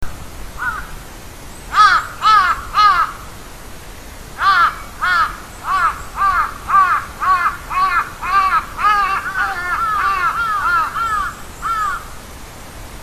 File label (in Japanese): hashiboso, bird; ハシボソガラス （嘴細烏）; 忠魂碑で、鳴き声; 蘭梅山; 野鳥